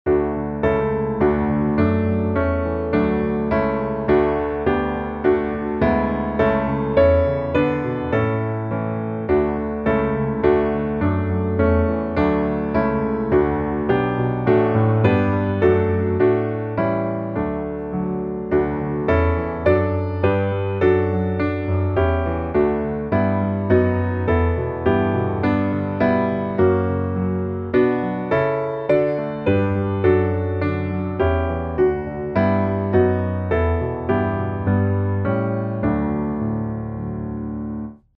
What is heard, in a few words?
piano